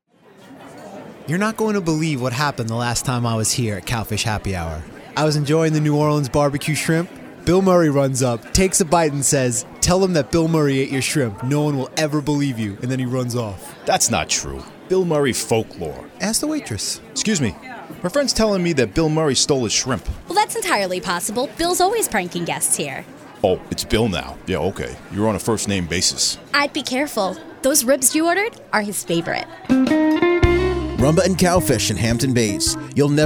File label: Rumba; Long Island Broadcasting